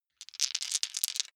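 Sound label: big three rattle in hand, wuerfel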